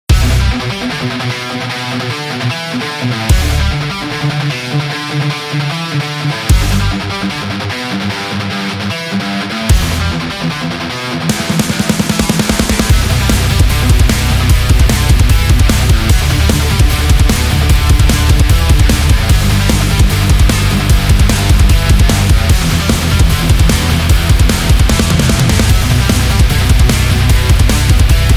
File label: Rock Explosion